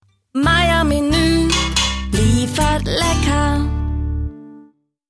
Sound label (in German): Soundlogo; Mayer Menue